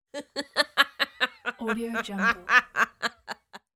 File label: young teenager laughing